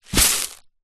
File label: food rice dry in plastic container set down on soft surface